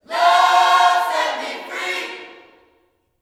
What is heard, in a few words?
Vocal Gospel MULTI PHRS; LUV FREE; Spectrasonics; Vocal Planet Vol.1 Gospel